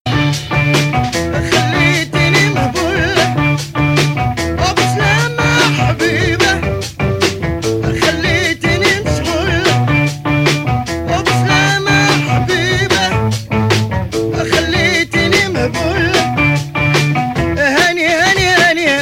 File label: Bass